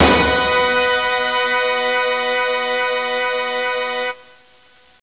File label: FANFARE, ORCHHITS